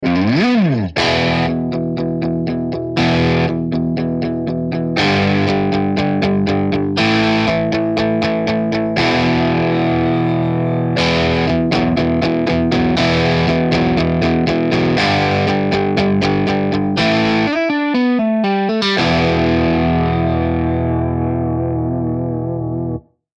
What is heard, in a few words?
strat clean